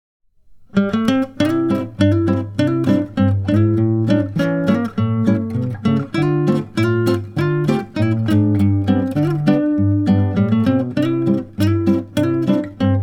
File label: Balloon